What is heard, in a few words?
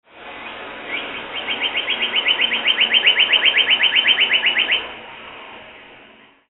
birdcall